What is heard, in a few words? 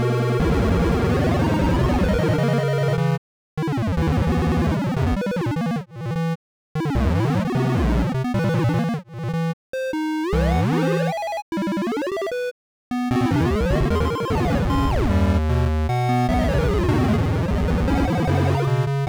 crazy song